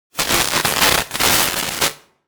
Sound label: electricity electronics sparks synth dense